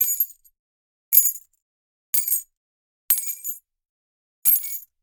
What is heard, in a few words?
Foley Keys Drop Tile Mono